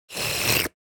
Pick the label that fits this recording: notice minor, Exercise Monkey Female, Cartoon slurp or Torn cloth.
Cartoon slurp